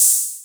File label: hat gump